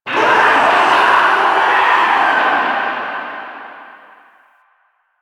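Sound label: Infested Distant; Warframe; NineteenNinetyNine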